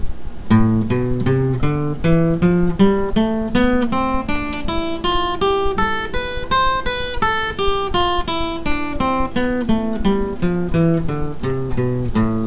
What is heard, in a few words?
aeolian